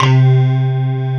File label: TIGHT KB, keys; East West - Global Sounds; S.I KEYS